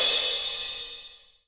cymbol

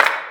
Clap Reverb

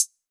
soft hit normal

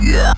Growl

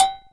Cowbell

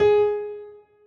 Key G#5

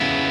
C#m

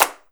Clap

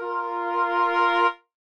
flute cut A#5 F5

